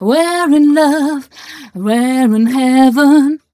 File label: f mel d, fem Vocals